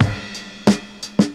Old School Break, Drums

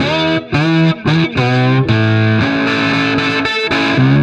Electric Guitar, Loops; Guitars

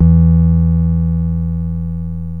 tri bass, yamaha rm1x synth

